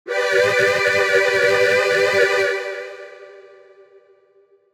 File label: Synthwave Pad, jup8